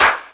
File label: CL, claps; EastWest; Dance Mega Hip Hop Electro